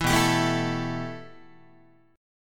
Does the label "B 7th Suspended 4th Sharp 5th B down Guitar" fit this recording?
Yes